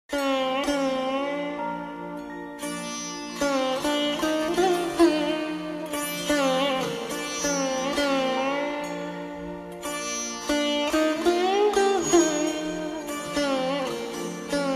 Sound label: Instrumental